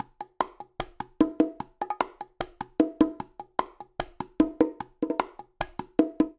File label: Son Montuno Bongo 150bpm, Latin Percussion; Ableton; Factory Packs